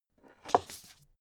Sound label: book shelve soft short